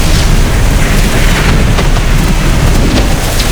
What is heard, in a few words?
stone Fire Loop; netent